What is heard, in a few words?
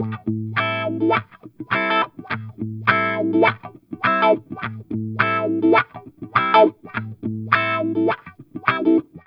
Guitar A min7, Funk